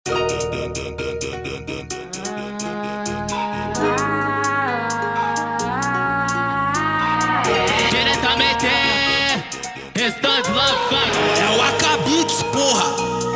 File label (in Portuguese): eletrofunk